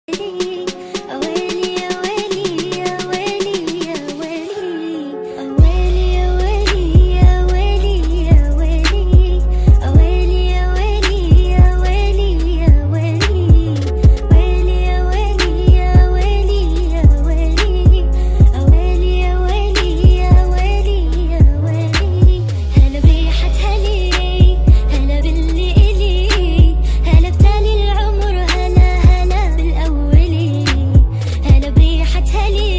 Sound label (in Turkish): Telefon Zil Sesleri Aweli Trap Mix